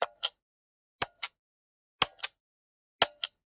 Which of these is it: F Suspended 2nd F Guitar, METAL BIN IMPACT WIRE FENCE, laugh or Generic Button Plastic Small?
Generic Button Plastic Small